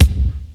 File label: old school wet rap kick drum single shot C key